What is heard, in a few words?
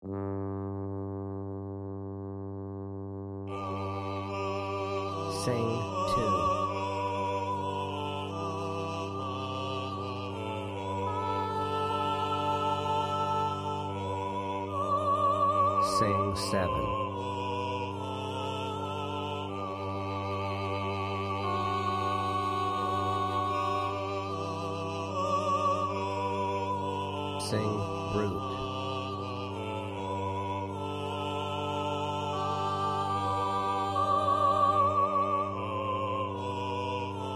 Chorale Voice Drone Alto Soprano Bass; Bach Chorale 1; classical ear training